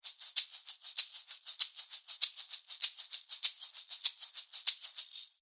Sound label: maracas sonor red; gmw s shaker; maracas roed